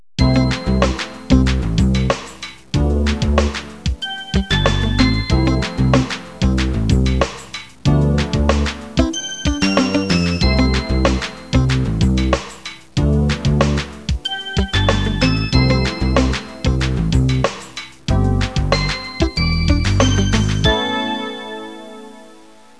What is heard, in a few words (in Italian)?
suonerie businessy